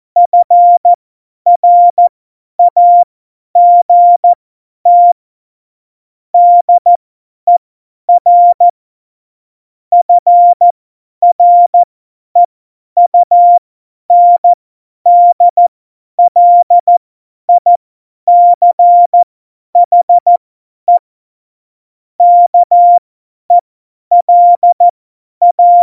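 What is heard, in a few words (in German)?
Witz, Morsekurs, cw morsen; Witze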